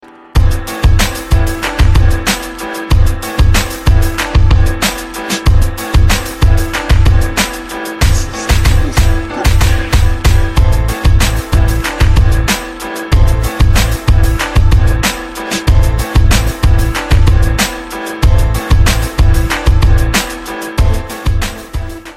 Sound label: Gansta Beats